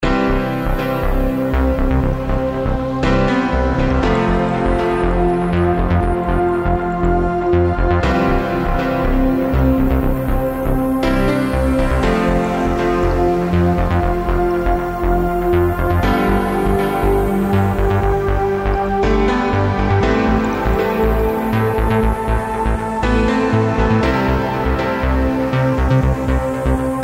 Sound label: Corporate World News